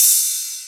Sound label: Ride, Rides; Electronisounds